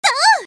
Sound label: flashbang; csgo; custom radio